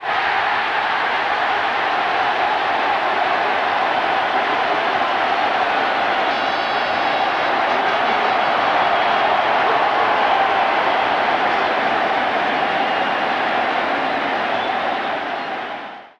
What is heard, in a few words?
Baseball Crowd Cheer; SFX Kit; Crowds